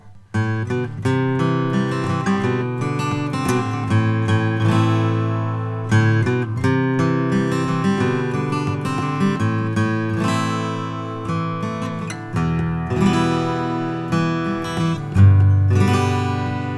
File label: martin 000c natural; steve miller